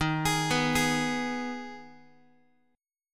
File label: D sharp Suspended 4th Sharp 5th, Guitar; arpeggiated; JGuitar